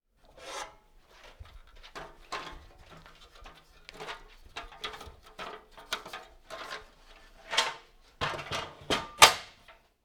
Ladder Aluminum Setup

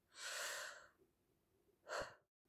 Inhale And Exhale

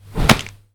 Kick Broken Rib

